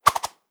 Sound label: False Maiden Attack